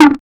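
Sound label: dull thud sound unsuccessful, blunt impact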